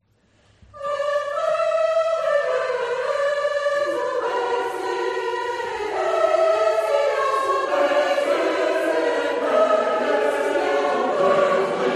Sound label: Credo Allegro; Concert Mozac